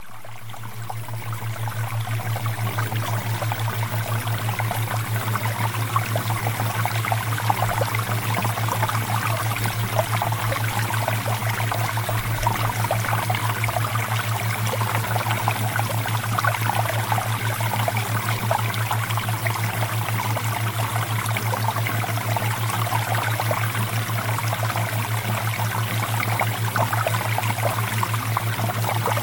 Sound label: Water Theta Waves